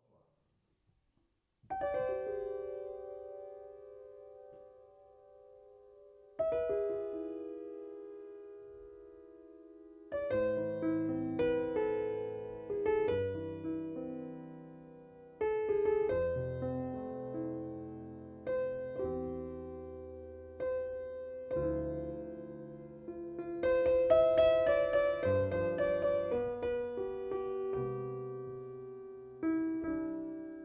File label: piano sketches